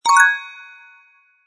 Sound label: collect Paper